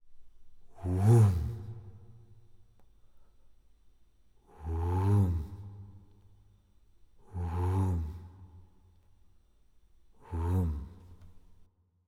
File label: kaiju adult ship entity idle; biogenesis